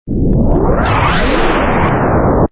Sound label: Power Bomb